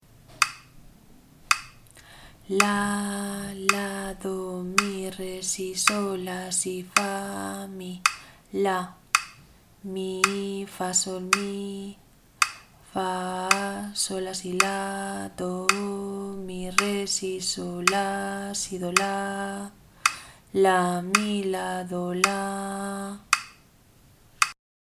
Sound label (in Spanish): ritmo 3 6 repaso